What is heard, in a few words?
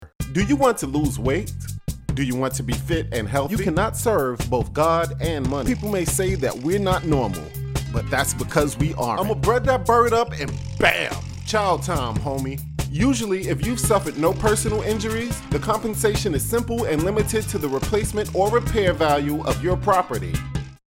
rock